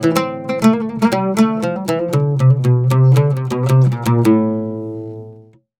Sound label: 120 oud